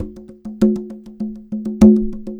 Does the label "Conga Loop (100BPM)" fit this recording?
Yes